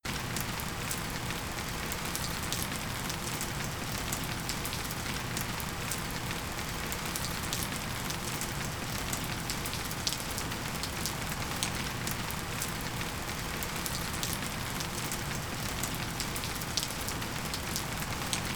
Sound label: Pouring Rain